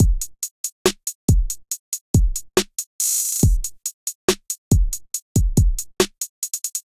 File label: MPE Kit, Trap Drums; MPE Crux Kit